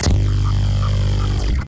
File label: down test